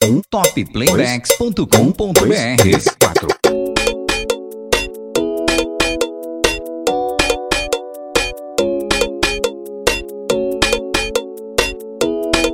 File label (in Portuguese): VERSÃO FORRÓ